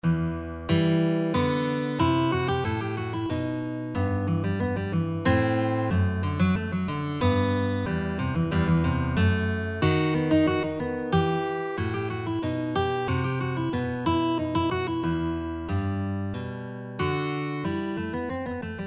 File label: rondeau guitar; purcell